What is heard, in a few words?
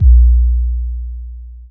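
bass drum boom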